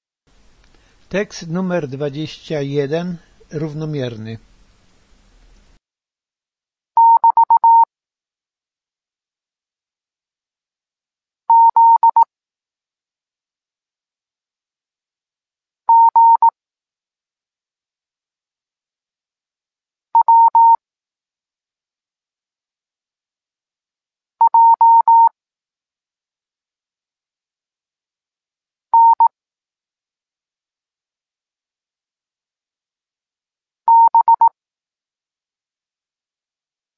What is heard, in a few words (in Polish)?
Tekst rownomierny, Melodia liter: G taaa-taaa-tit Z taaa-taaa-ti-tit; Doskonalenie odbioru poznanych liter. Ilość znaków równomierna